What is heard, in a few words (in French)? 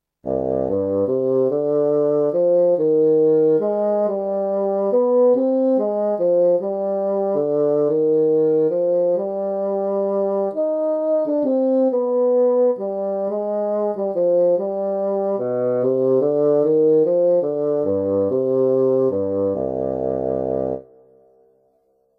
basson